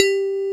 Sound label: SYN CHIME G3; Greytsounds; Scott Peers Sound Engineering